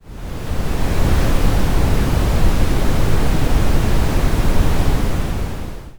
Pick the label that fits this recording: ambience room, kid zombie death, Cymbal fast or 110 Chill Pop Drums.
ambience room